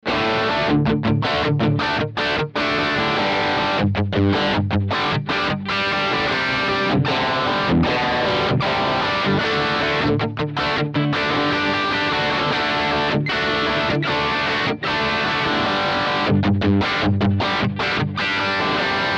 vox valvenergy mystic edge

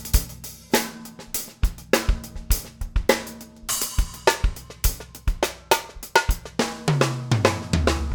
drums dry; softube tsar-1

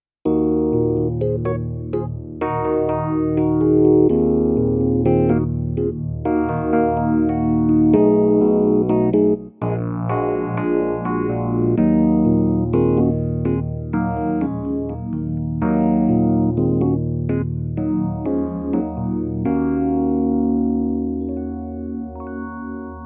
fender rhodes; kurzweil forte

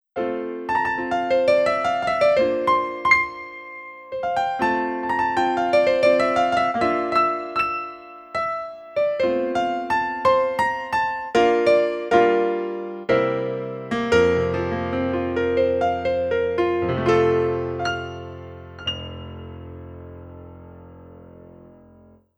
PX S5000 pop piano

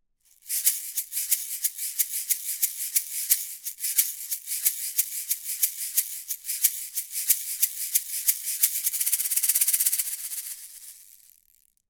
mojave ma-50 shaker

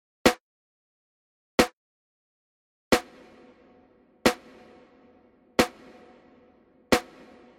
large hall reverb; Lexicon PCM Native

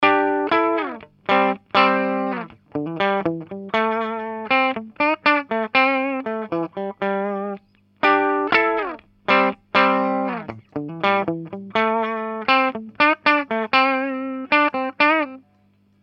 miced clean amp contour mid; two notes torpedo reload

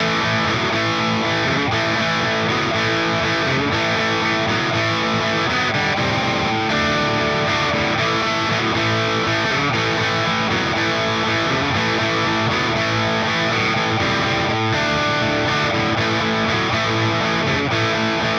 rock orange bridge; harley benton cst-24

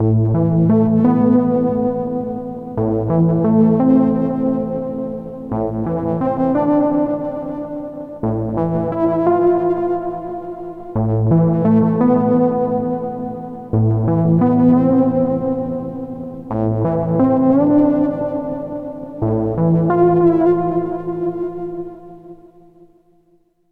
arturia matrixbrute ambient lead delay

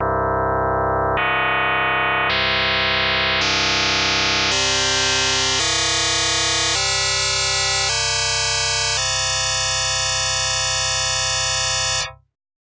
fm-synthese freq rising harmonics; fm-synthese erklaerung

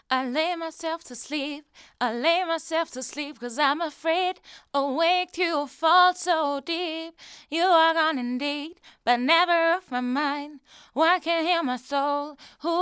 vocals; sennheiser md21

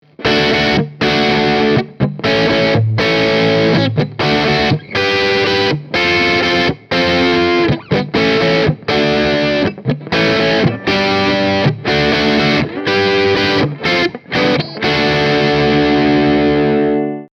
riff bridge coil-split, epiphone sg g-400 pro

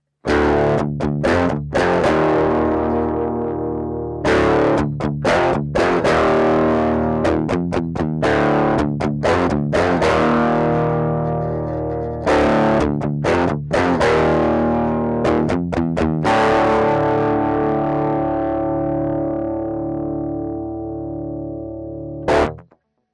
blackstar; subdecay

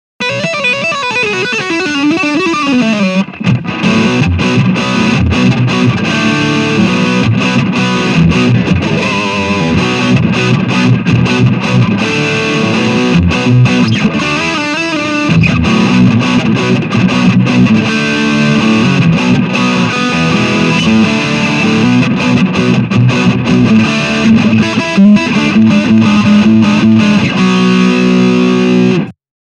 heavy; kong solidthirty

